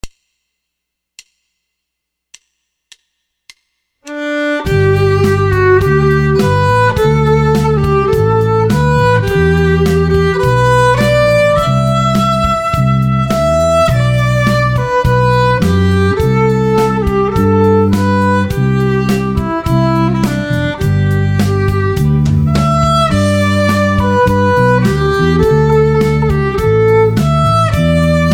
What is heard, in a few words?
Playalong Auld Lang Syne